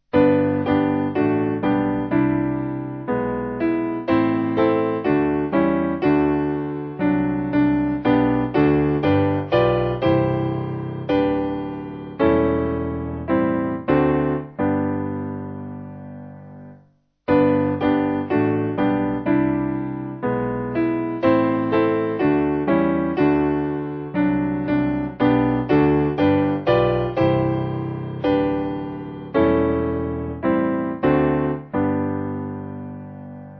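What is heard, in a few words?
Piano, church music backing; God Who Made; Sommerlied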